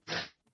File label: truffler calm, utterance